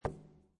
Billiard Pool Snooker Billiard Ball Hits Side Of Table; Pool Sound Effects